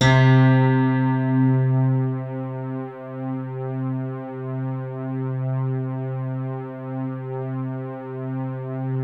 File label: BALLAD C2, E-PIANO; Music Store - Claudius Diemeter Schwerlos